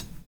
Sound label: N.Y FILL; Univers Sons